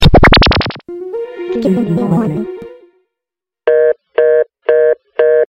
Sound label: good morng alert